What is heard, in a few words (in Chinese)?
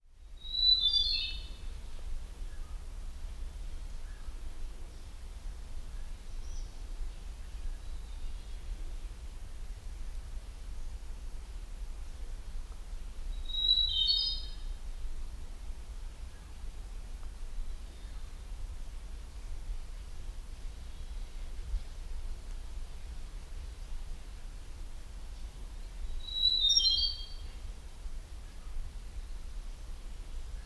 明池